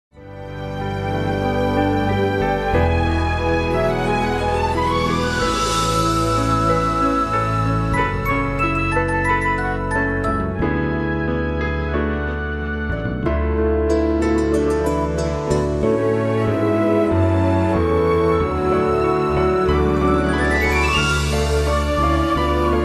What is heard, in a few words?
karaoke instr; disney; princess